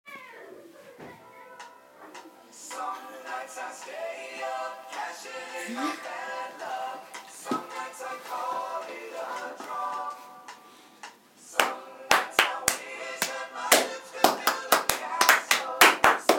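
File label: clapping rhythm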